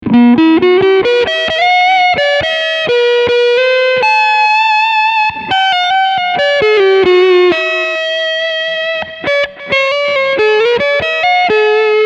Leadsound beide PUs

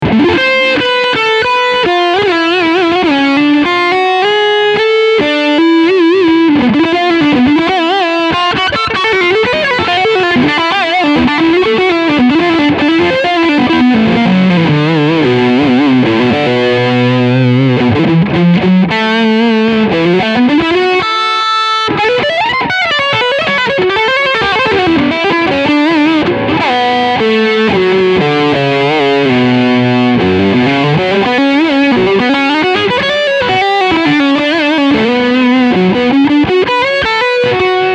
Leadsound Steg HB

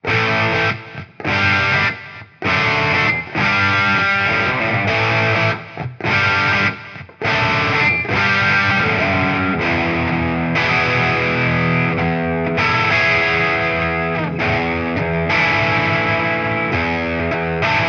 Gitarren Plug-ins Amplitube 5 Crunch Rhythm

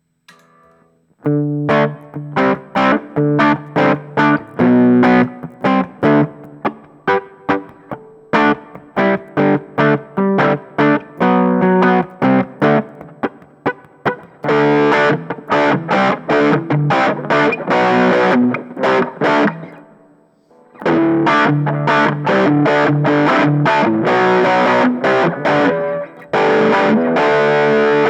Overdrive angezerrter Amp

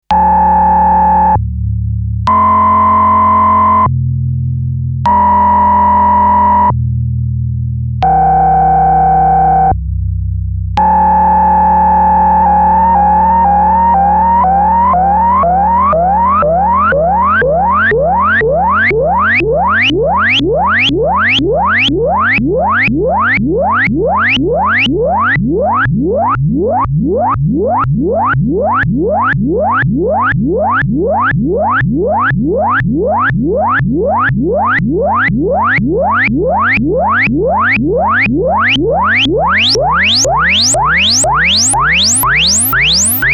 Behringer Model D Resonanz und LFO

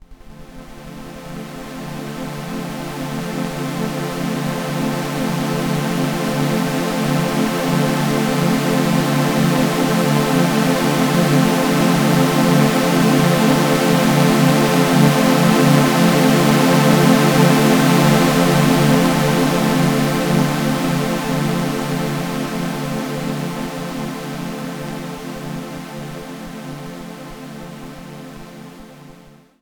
Polyphon Saw Pad; Demon Core

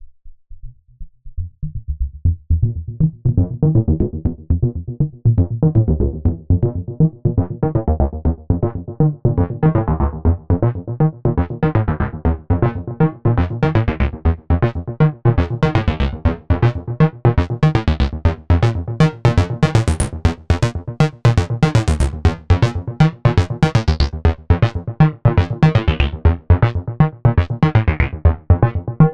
Moog Moogerfooger Fooger Synth Sequenz

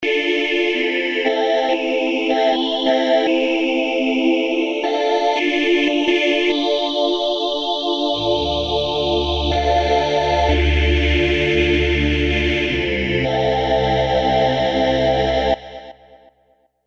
Cybervox PolyMorph schnell

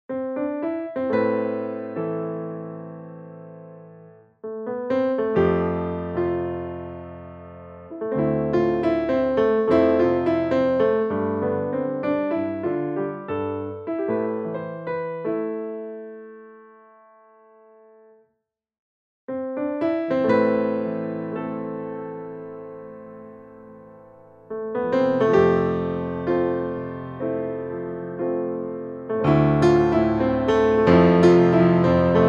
Melodie ohe und mit Dumper Player

